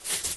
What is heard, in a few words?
scratch